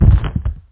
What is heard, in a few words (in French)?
chute